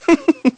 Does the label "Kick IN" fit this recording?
No